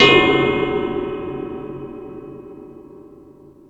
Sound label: Multi FX